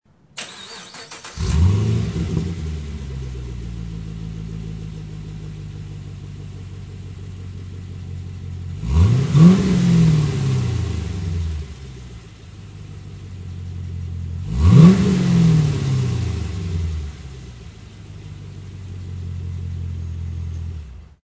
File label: ultra flo polished ss muffler sound